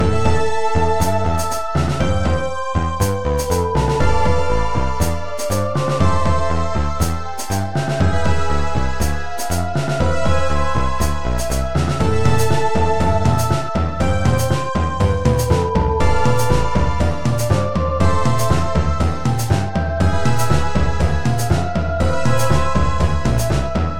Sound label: Bass Riff Opening; thunder kid ii null mission; xbox series xs